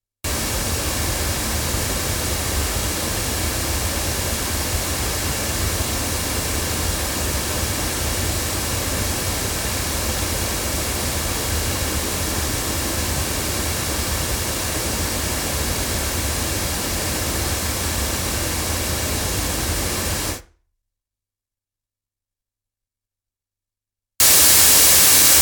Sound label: Violet Flamingo jr loudspeakers, microphones